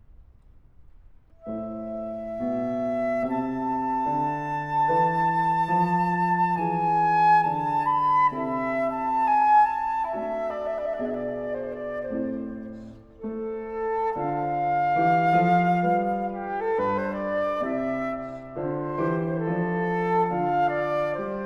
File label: flute sonata in c dur adagio; bwv 1033 iii